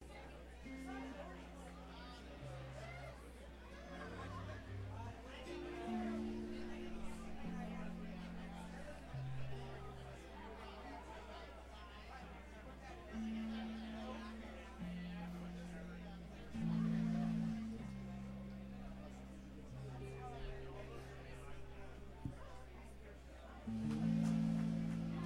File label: tuning intro